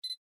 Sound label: focus lock; clock